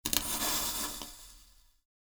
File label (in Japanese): natu